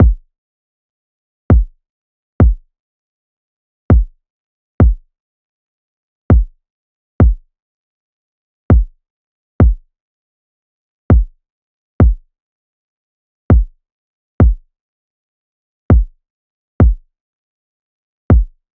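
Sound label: 100bpm, Kick, A minor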